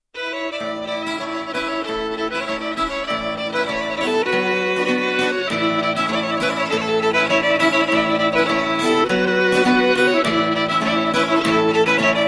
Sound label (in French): Pentosali, Grece